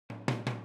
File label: Boogaloo - Hi-Hat, 82 BPM; Drums; Intro; Sonic Foundry; Drums from the Big Room The Mixes